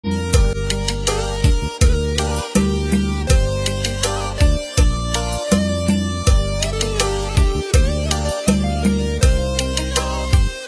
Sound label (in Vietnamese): Hòa Tấu Rumba Không Lời Guitar Ngọt Ngào